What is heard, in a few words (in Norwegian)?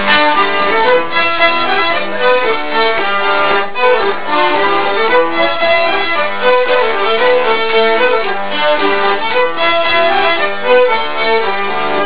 Musikk; PhD dinner